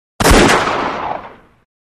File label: Pistol Or Rifle Shot